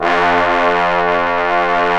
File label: BRS BONES, Orch Ensembl; Roland L-CD702